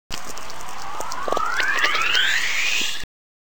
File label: earth frequency reverse